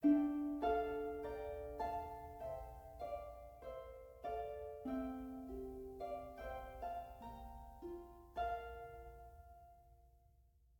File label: harp